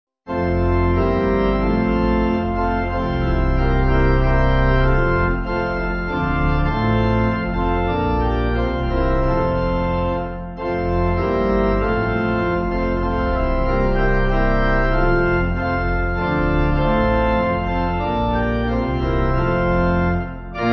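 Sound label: Pipe Organ; Once In Royal Davids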